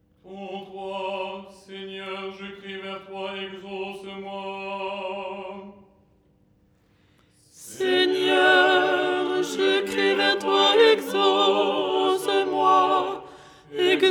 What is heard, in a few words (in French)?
alto; ton 3